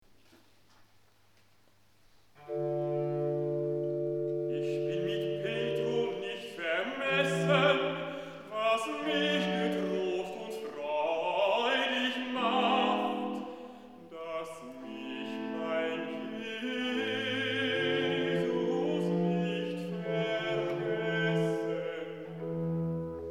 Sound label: Recitativo B; BWV068 Also hat Gott die Welt geliebt